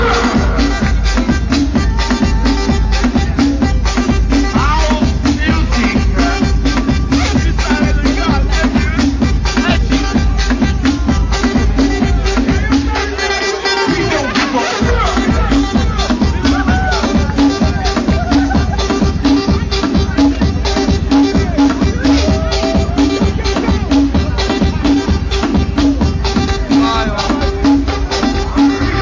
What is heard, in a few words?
Cool Dance Beat